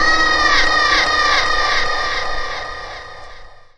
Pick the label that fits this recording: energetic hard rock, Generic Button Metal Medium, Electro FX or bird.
Electro FX